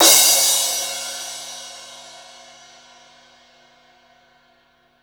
CYMB

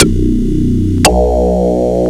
PPG BASS; Rubber Chicken Software Sample Bank

